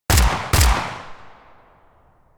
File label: Shoot